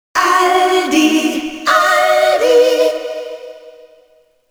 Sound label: ALDI jingle